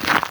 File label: frosted snow footstep; everness